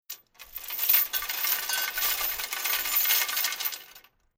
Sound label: Drag Chain Slow With Metal Rattle; Chain Sounds; Metal Sound Effects